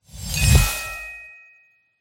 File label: wild break; Fantasma; hades River Of Souls